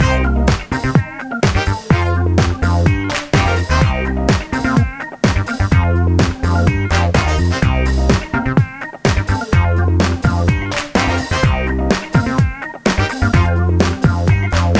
Disco Boucle; ener g dance squad imagine modern dancer 2008 nintendo ds gamerip

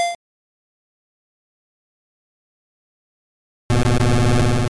Egg Bomb

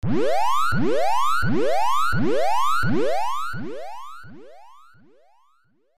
Bonus; Hisshou 777 Fighter; SNES; Trianof

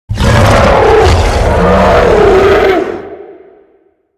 DRAGON DEATH; BOSS ANCIENT DRAGON

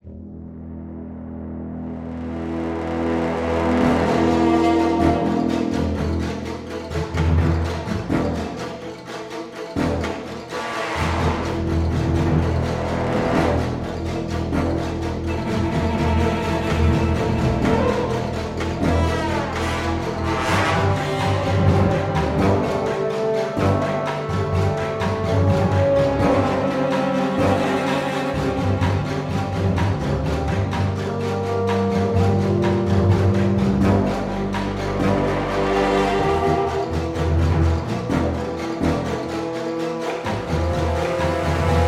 Loop Fight

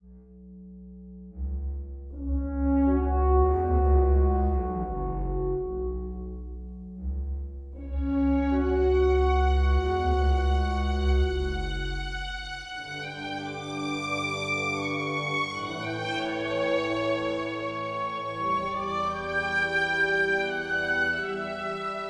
World Music; Jurassic World Evolution 2; PS4 PS5 Windows Xbox One Xbox Series X/S

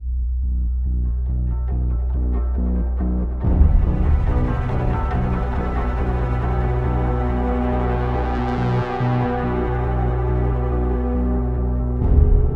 Suspense Oneshot

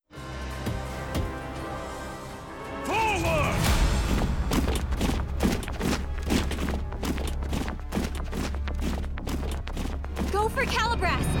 Battle Start; Zak Storm Super Pirate; mobile

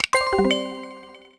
Complete

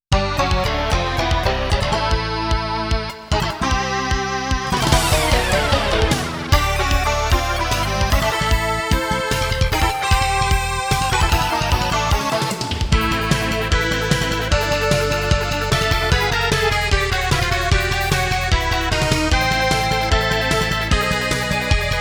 MIDI Instrument Version; power dolls 1 soundtrack resonance

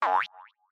hair attack; sasuke

